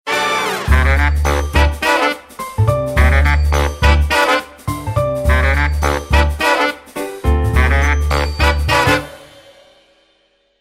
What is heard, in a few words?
Blue duck, ringtones; Samsung Galaxy Chat